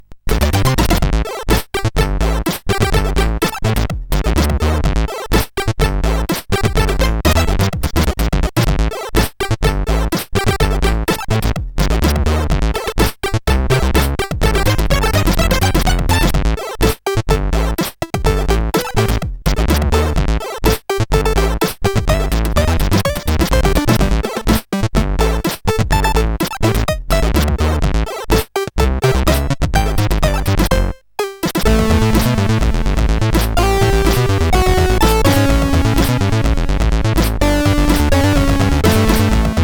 Shovel Funk, CSG8580R5

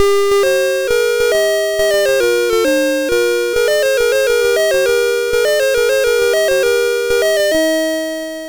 sid MOS6581R3, GAMES; Alice In Wonderland

